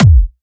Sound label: Trance Kicks